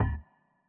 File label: reel Bounce; netent